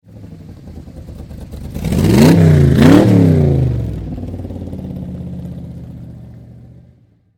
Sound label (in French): Viper GTS - point mort